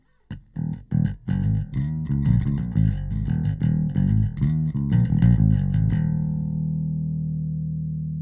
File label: bass bypass; SSL X Gate